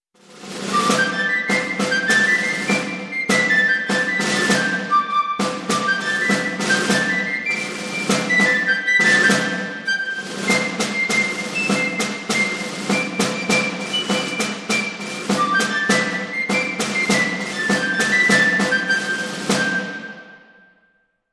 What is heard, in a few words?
Piccolo